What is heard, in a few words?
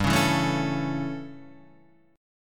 A Major G; A/G Chord {3 4 2 2 2 5} strummed